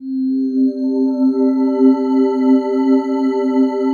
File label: PAD, ATMO PADS; Best Service; XX Large